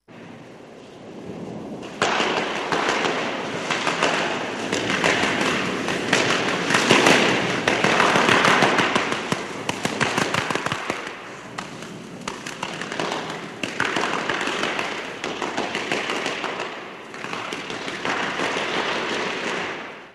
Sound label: Broom Slaps On Ice, Curling